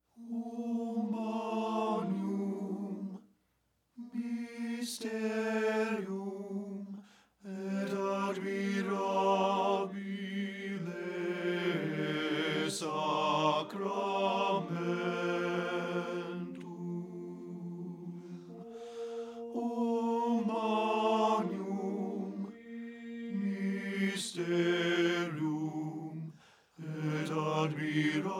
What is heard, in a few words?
bass; o magnum mysterium